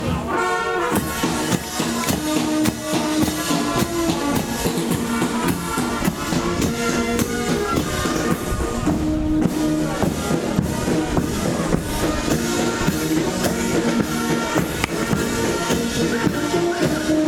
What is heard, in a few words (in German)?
blaskapelle; jaroslawl